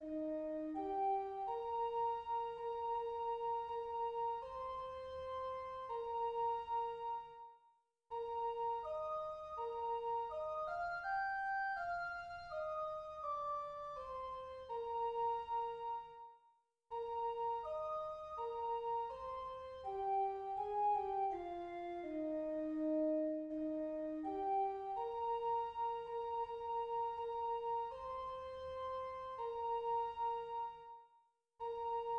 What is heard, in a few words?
soprano alone; bach 140 wachet auf; choir; bwv 140